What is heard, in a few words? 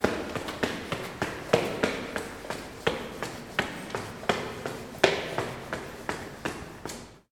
Running Upstairs